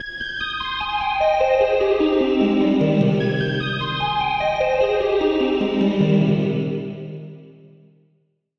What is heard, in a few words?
Key